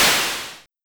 Simmons Clap

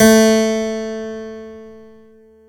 KEY HRPCR, Roland; Roland - LA Composer vol. 1

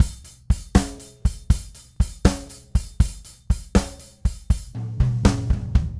Rocky Mountain Groove, Drums

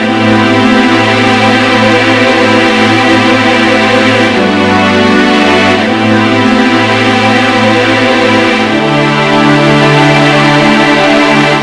G 165bpm, Strings, Drum & Bass; Total Drum&Bass

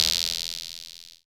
FX DRM, Analog; Sound & Vision - Gigapack II

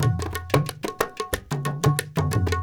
India, Heart of Asia; Spectrasonics - Heart of Asia CD2

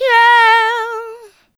YEAHEAH, Funky Vocals, SHOUTS; USB Soundscan vol.18

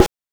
GHOST SD; NES DPCM; MIDINES

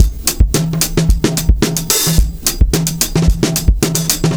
R&B Drums

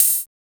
GATE OHH, TECHNO; Drums In Your FACE!

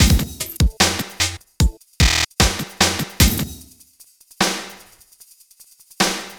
Beat, Futurist Drum & Bass; Drums & Percussion

